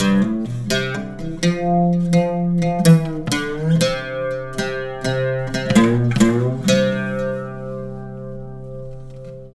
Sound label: SITAR ETHNO 125; eJay; Guitar